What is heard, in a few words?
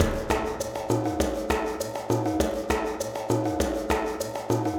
Cave Ceremony, percussion